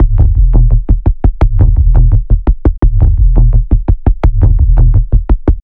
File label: clicky 70 BPM unprocessed, bass